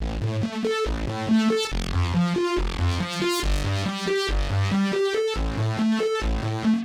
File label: synth tekno 140 A; Techno2; Keys